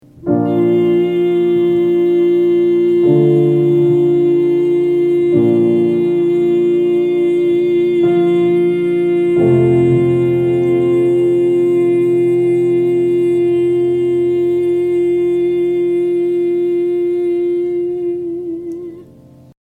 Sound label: Neeee long held